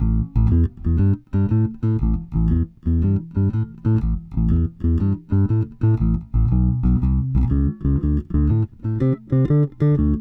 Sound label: Blues Bass Ending; Digidesign; Pro Tools Essential Loops